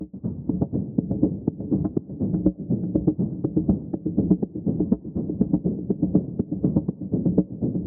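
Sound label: 122 BPM Zebra A major F minor Tonic B; mmb studio soundtrack essentials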